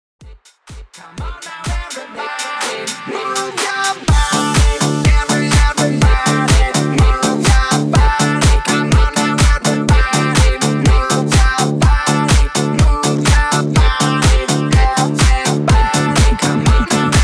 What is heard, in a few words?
MASHUP, 124 BPM